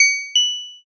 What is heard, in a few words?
shop enter